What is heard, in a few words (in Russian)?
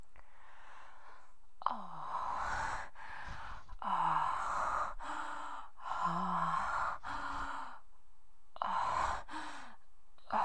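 оргазм нежный спокойный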